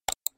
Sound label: Clean Mouse Click